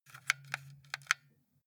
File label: console controller xbox joystick move multiple